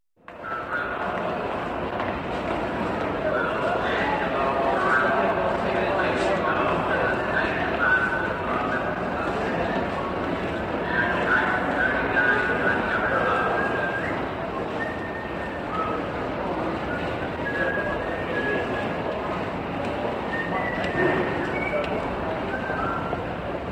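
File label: at a railway station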